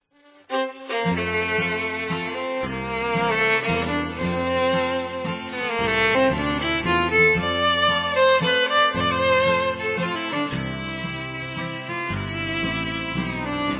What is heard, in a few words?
Waltz of Shannon, Texas Fiddle